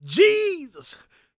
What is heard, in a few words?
GOSPEL MALE; Spectrasonics Vocal Planet